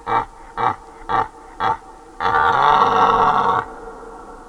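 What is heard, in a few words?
red deer or maral, Cervus elaphus; Марал – отдельный подвид вида благородных оленей.; Марал – благородный олень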